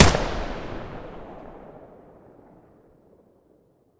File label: ak74u muzzle stereo; STALKER; IWP